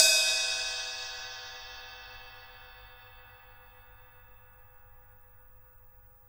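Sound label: Jazz kit ride, Drums Acoustic; AKAI; JAZZKIT 1; 90s sample CD; USB Soundscan vol.10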